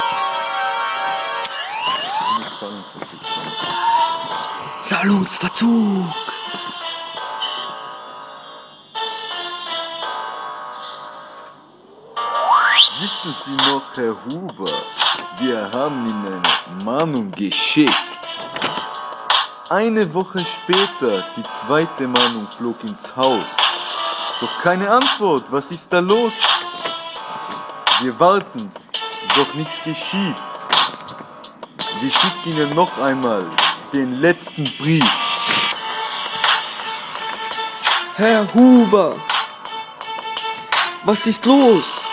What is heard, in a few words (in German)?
Rap